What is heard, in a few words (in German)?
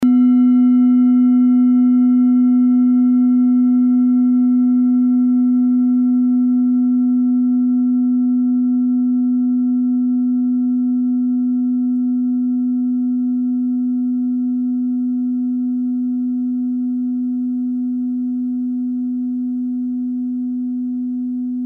klangschale set; Klangschalen-Chakraset; Gewicht = 810g; Durchmesser = 17,1cm; Höhe = 8,9cm